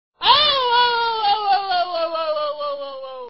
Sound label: toad